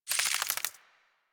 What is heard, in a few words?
Infested Ashen Move Turn; Warframe